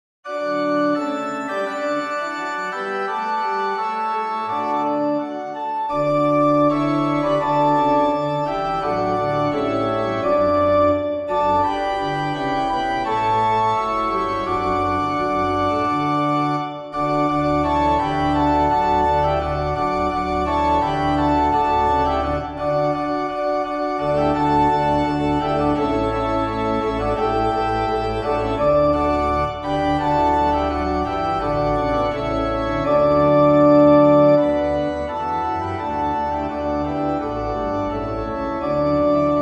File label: Organ and Trumpet Descant